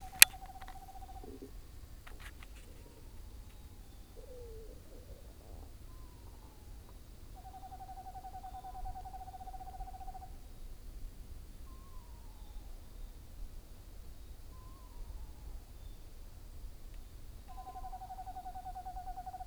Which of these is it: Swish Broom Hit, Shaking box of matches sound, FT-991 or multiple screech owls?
multiple screech owls